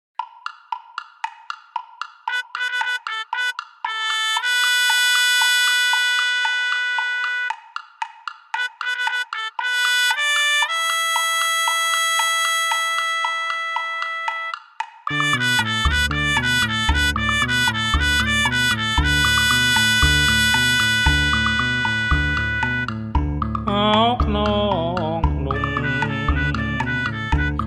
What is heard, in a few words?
G major voice music